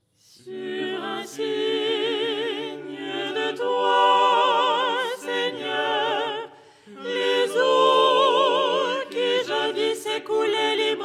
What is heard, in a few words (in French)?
soprano; 1er hirmos du canon du dimanche; Les 8 tons en français